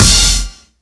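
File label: STACK BRASS, Ragga & Dub Reggae; RAGSTACKS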